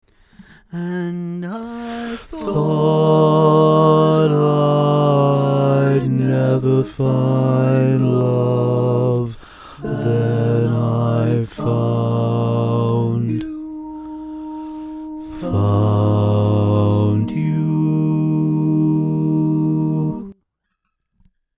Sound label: Bass, barbershop